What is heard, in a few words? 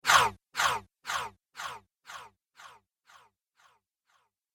Phat Whoosh Echoes; Whoosh Sound Effects